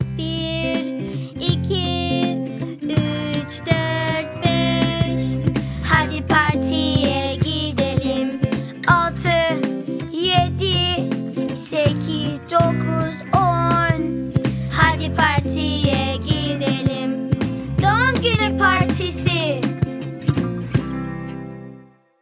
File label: song turkish